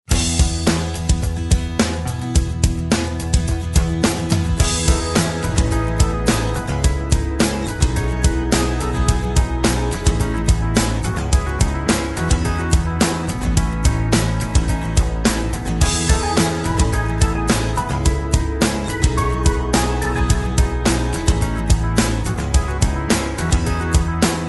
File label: rock pop; Background Music